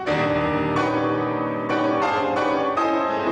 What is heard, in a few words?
Piano Sonata; Gideon Klein